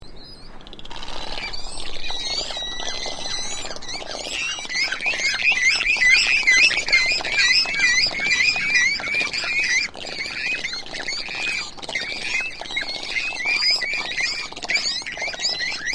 swallow

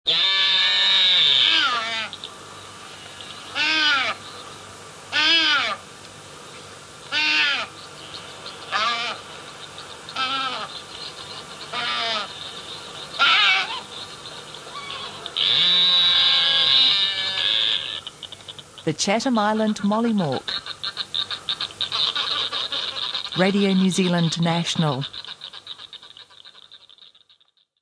chatham island mollymawk

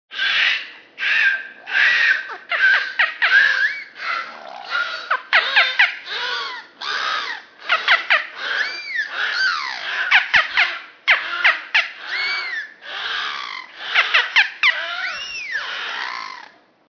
beluga

